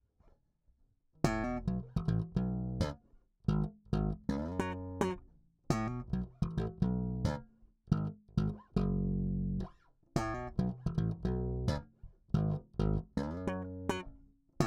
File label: slap S2 Neck solo